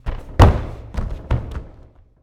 Boxing Ring Bodyfall

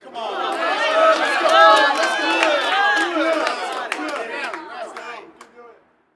Street Ball Crowd Encourage; Crowds

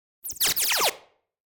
Space Machine Beep Scrape; Hollywood Edge; sound designer's toolkit v2

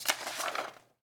Book Page Turn; SFX Kit; Foley - Misc

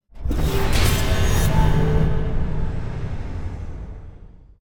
Stinger Impact Ring Sharp Metal Ping; Hollywood Edge; sound designer's toolkit v2

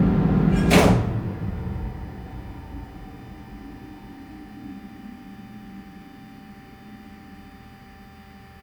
tram; best service Blue Box

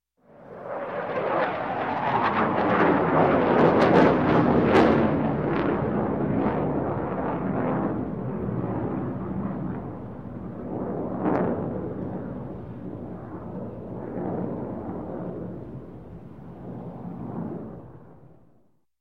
CF-18 Hornet Pass Overhead; Sound Ideas; Series 2000

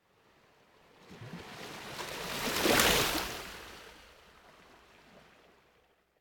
Ocean Gentle Water Lap On The Coast Surf; Hollywood Edge; sound designer's toolkit v2

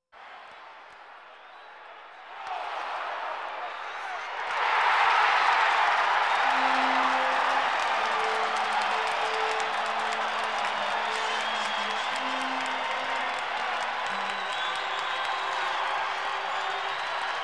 Sports crowds

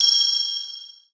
Pickup Gem Bells; SFX Kit; Pickups

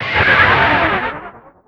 Pickup Guitar Scrape; SFX Kit; Pickups

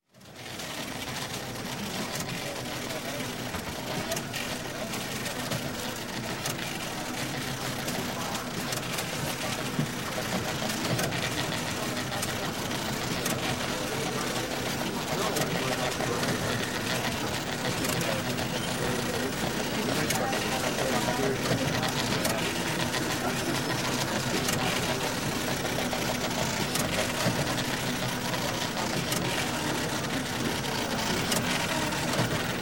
Teletype Room General Ambience, teletype Machine; voices; Sound Ideas; Series 2000

